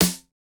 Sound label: snare samples, Rock